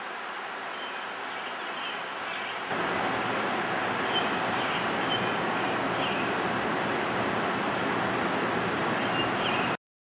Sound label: Rufus-sided Towhee